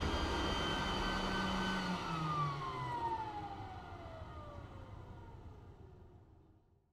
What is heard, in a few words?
vehicle tank turnoff